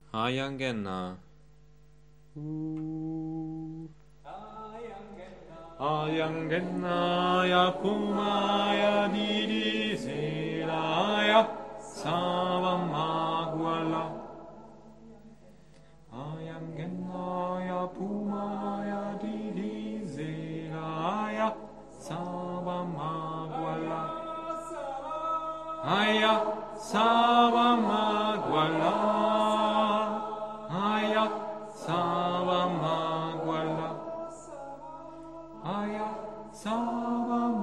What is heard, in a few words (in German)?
Sopran; Aya Ngena